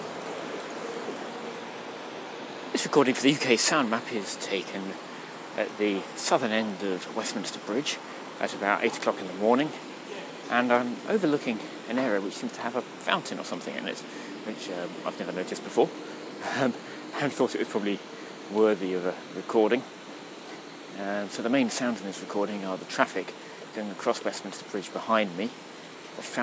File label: fountain near westminster bridge; uksm